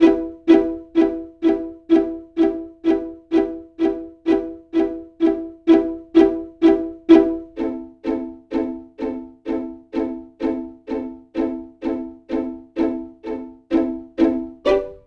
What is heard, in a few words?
127 south hip hop pizz strings Em; Dance DJ; Pro Tools Essential Demos; Digidesign; Essential Loop Demos; Demo Session - Dance DJ